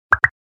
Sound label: Cartoon accent popping